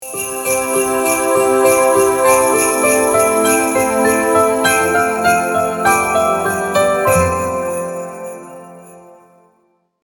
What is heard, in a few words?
festive background music